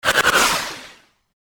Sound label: lazer mach gun type